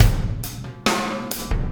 Impact Crater Beat, Processed Drumkits; Sonic Foundry (Sony Creative Software); Processed Drumkits Zero Gravity Beats

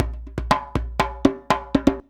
119 JMBFNK; Univers Sons; Basicussions

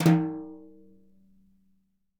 TIMB BR HI F, Synclavier Percussion; Synclavier Percussion 2; World & Orchestral; ILIO

